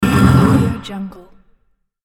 cave stone sliding

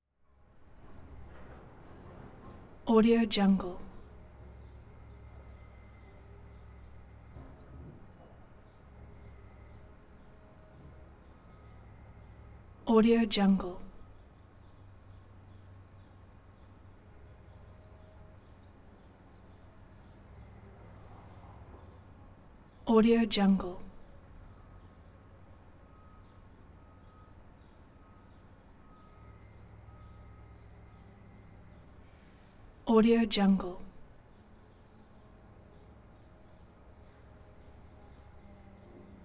street work caterpillar tractor digging hole

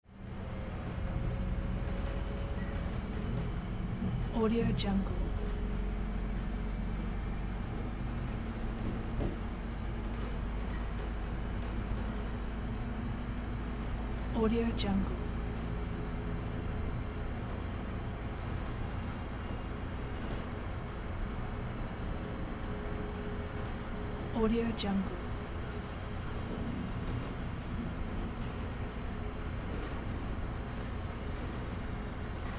box truck cargo area ambience

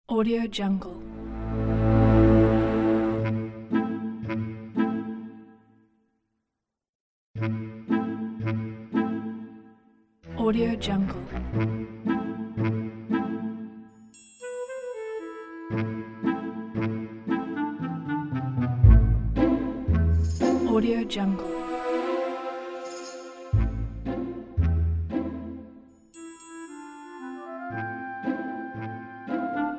sneaky comical scene music

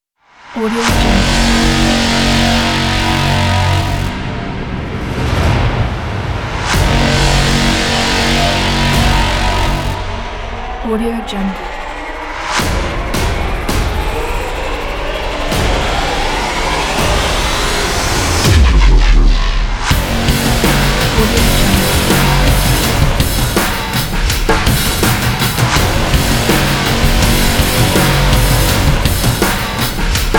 trailer opener; music-sx

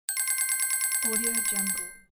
scoring

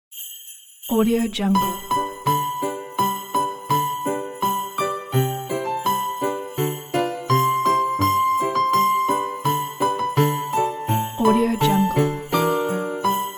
jingle bells

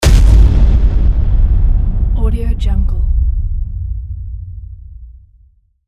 deafening cinematic hit

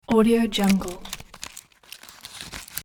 opening cardboard pill box

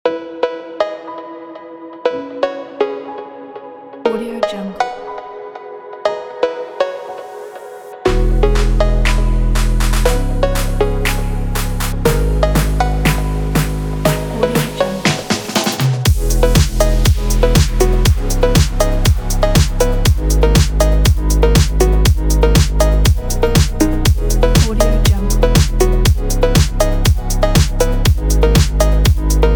phonk the uplifting event

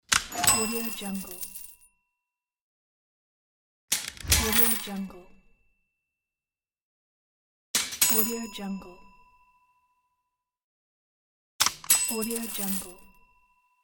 payment

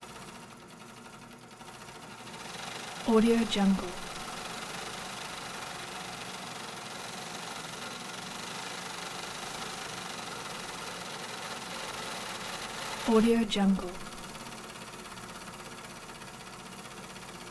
amb int garment factory; collanderson

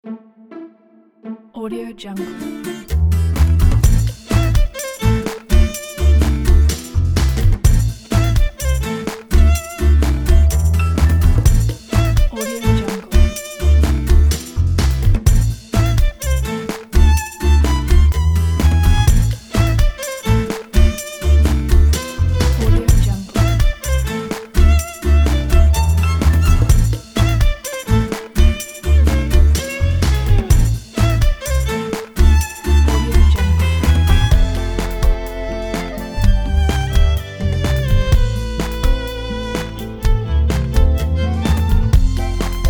gypsy violin

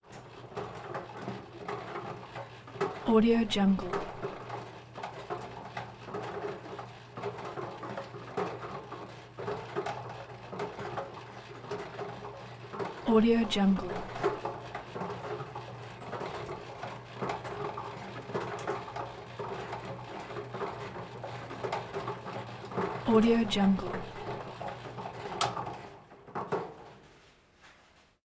bingo machine ping pong cage start run; prosoundfx

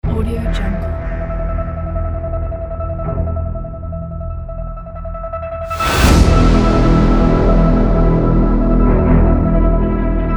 trailer intro

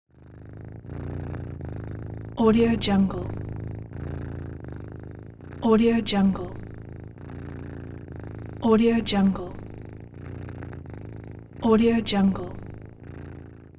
cat purrs

